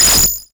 Cwejman S1 Ringer Snare

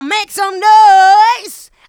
Female Vocals; Houseworx

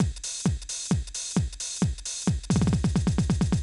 Beat Mix, 132bpm; Construction Kits; Big Fish Audio - Old Skool House; OSH Belgium Beats

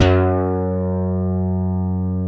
BASS MIX, DANCE

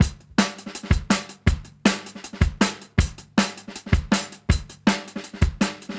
160 Standard DnB Hi Hat Clean, Live Jungle Breaks; LiveJungleBreaks Mini SP

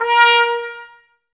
Woodwind Brass Ensemble; Miroslav Vitous

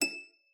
HARP D#7; Xsample VOL-8; HARP P+MF

